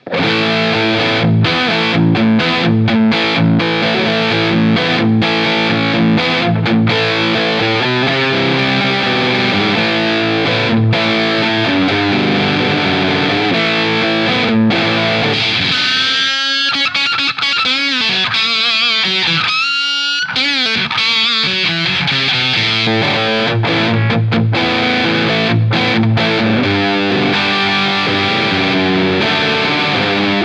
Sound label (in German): Tropical Fuzz Nectar Alle Regler in Mittelstellung; Beetronics Abelha